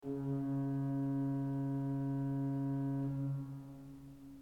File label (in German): ton, orgel